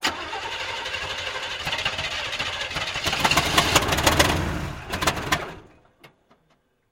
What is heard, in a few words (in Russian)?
zvuki startera avtomobilia